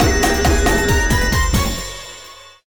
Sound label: free spin init; Amatic; Magic Idol